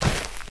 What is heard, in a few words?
walk